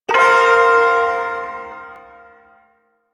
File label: Shawzin Pent Min Chord; Warframe; Duviri Ersatz Shawzin